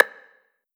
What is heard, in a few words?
Perc Rim Sparkle, 909 Percs, Vintage Drum Machines; Slate